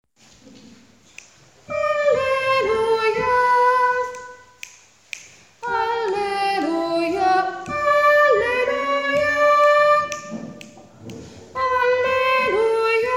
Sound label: alleluia soprani; hassler